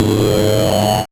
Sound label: Noise, 8-bit, Bitty Noises